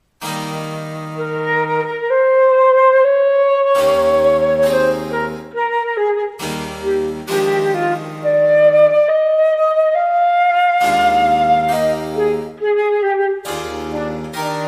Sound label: bach trio sonata live rec